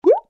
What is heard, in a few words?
button